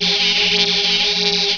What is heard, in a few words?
magic